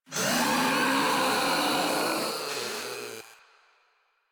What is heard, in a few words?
Infested Ancient Death; Warframe; Proto Infested